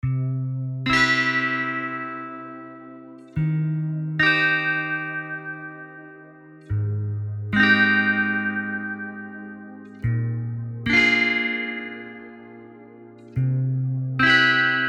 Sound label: Fresh Rain